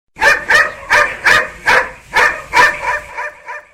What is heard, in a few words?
Angry Dog